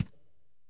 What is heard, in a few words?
reel Stop; fairyhansel